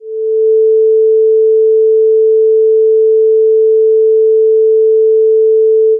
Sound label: sine 440Hz